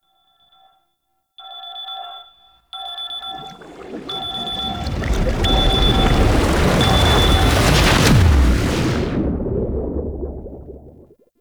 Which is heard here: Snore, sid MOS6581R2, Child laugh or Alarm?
Alarm